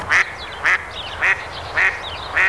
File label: mallard